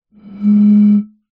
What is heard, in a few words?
bottle beer empty blow air across top